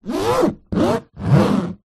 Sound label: flight case hand finger rub scrape along top metal; foley